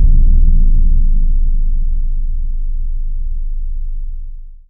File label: BIG BD, DRUMS; Spectrasonics; Heart of Africa